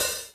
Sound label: Hat open; 300 Drum Machines; DigiTech RP200